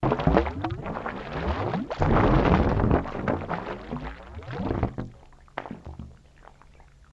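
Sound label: Water Through A Hose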